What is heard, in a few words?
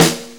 Snare C, Snare Drum; Sonic Foundry (Sony Creative Software); Drum Tools